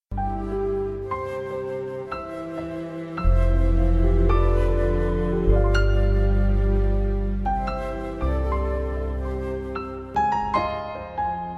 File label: wedding music